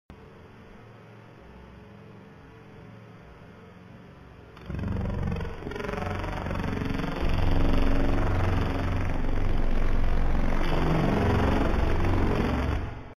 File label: Signal